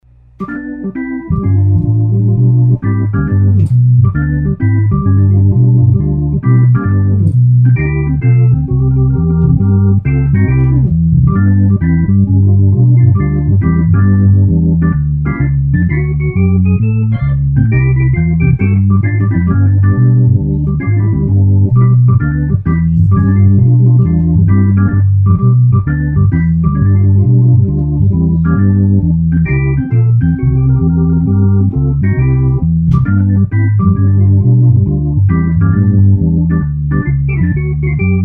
Hammond C-3 examples

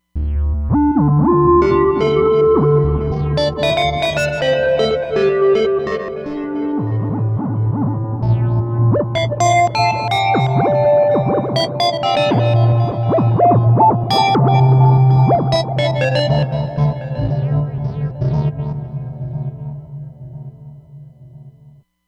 Euronal Synth, Roland Fantom XR

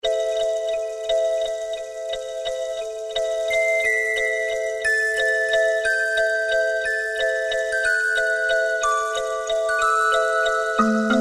Pebble Bell, Alesis QS8; Factory Preset examples

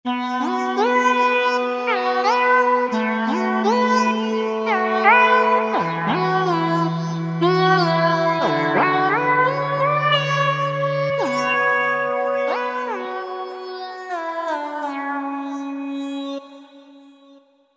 Riddle Vox, Roland V-Synth; Factory Patches

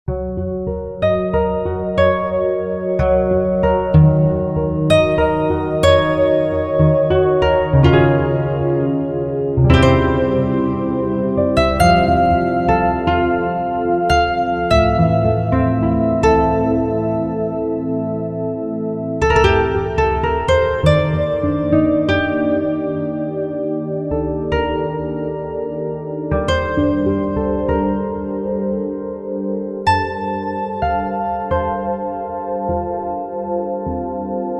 Heaven Nylon, Roland SRX-07 Ultimate Keys

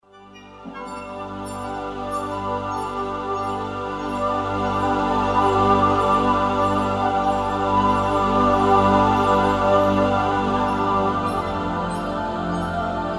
ME Aquavox, Yamaha TG77; Factory Presets

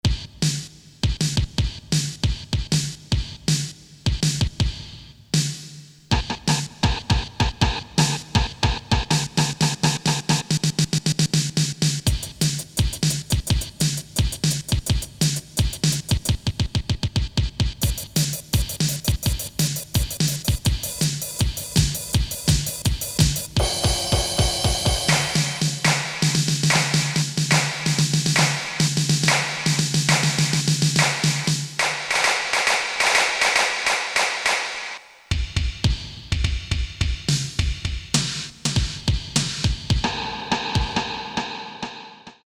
VCA

